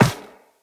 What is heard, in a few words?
Walk Metal Perforated, Footsteps; Operator